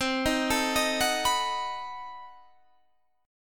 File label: B 7th C; B7/C; arpeggiated